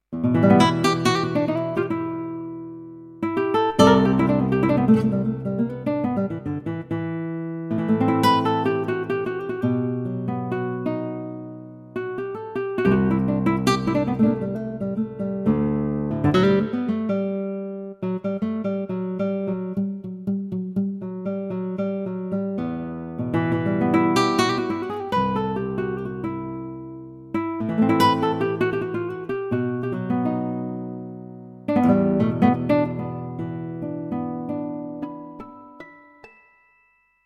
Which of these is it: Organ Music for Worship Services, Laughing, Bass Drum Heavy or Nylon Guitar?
Nylon Guitar